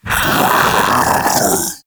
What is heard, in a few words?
Infested Splitter Joined Vocal Alerted